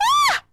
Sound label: female orgasm